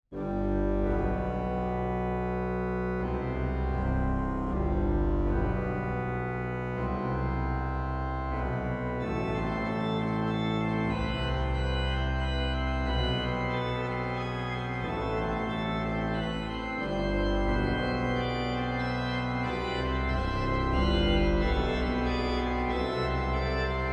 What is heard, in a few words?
fanfara